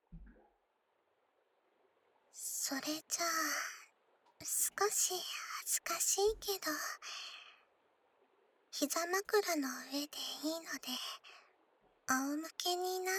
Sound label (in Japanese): 耳の中をマッサージ, ASMR